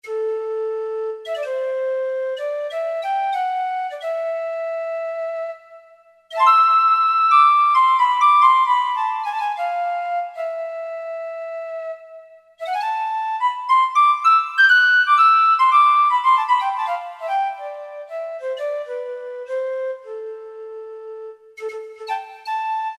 Lyric Flute, Alesis QS8; Factory Preset examples

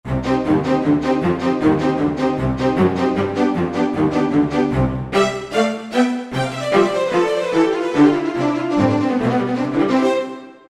Fast Attacks, Orchestral

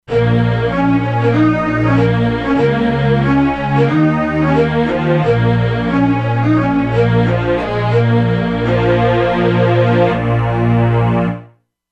Rotary Chmbr, E-mu Proteus-2; Factory Presets

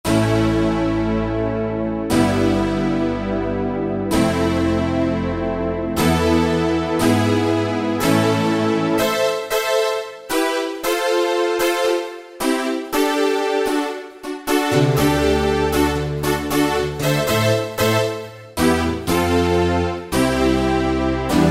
Attack Stack; Kurzweil K2000 Contemporary ROM

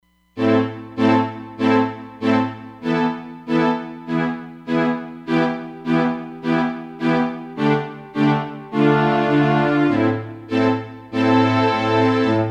Chamber Str; Roland Fantom XR

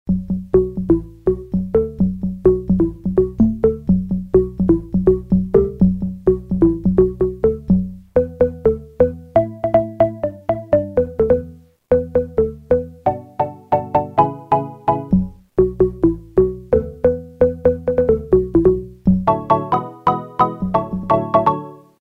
Organ Tong; Roland M-DC1

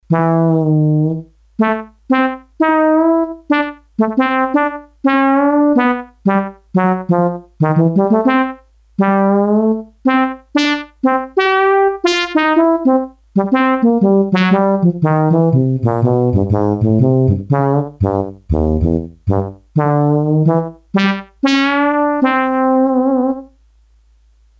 Trombone, Korg DS-8; Factory Patches

